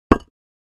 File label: Patio Square Impact, concrete & brick